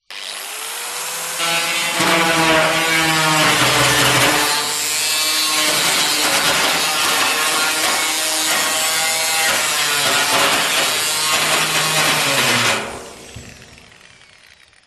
industrial angle grinder med long

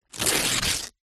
paper receipt rip; zapsplat

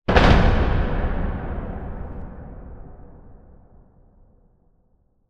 hit heavy metal hard reverb cinematic; sound design; zapsplat

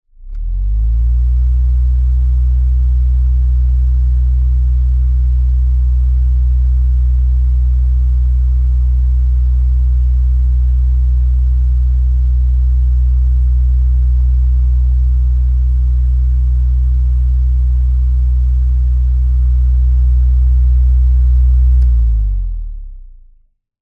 household fan turn on run med off close rear

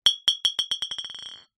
bottles glass empty x2 knock together

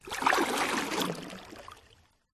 paddling canoe water deep movement single; silverplatter audio

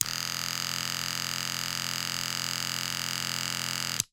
Electric Razor Turn On Run Turn Off

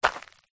footstep single dirt; foley; zapsplat

